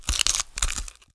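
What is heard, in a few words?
skeleton ambient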